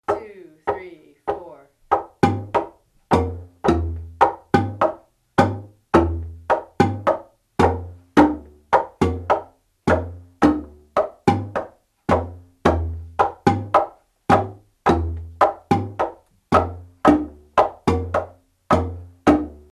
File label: bass main, marimba; kare mugomba